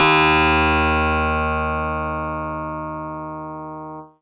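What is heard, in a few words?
Harpsichord DX100 D#2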